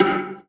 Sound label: tonal one shot G; Pop; Slate; PASADENA Pop Sample Pack; Tonal One Shots